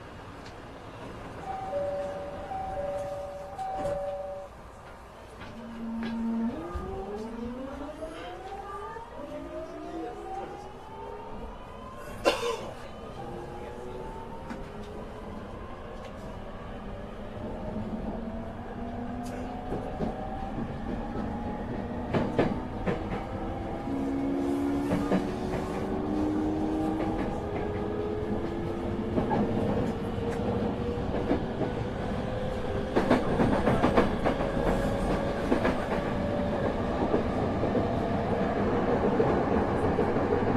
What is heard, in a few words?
yokosuka sobu rapid E217-2, train